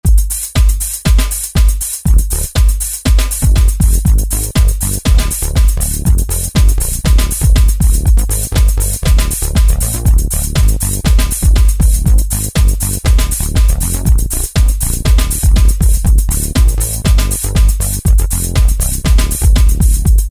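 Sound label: drum-Split; E-mu Orbit V2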